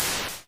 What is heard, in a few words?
dash; PinmikPanik; death